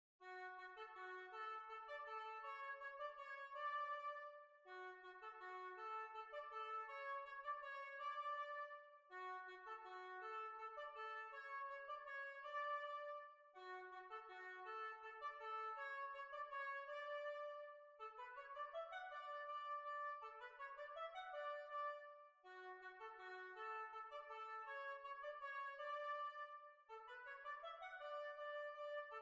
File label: Waltz